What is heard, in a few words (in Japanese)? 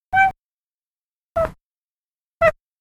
一度日本語確定してからのEnter押下。; Enterキーを押す音